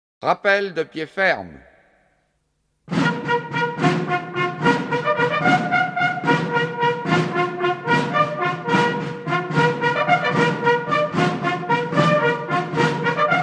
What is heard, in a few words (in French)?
rappel de pied ferme clairon ringtone